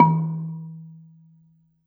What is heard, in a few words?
Gambang Soft Beater, Gamelan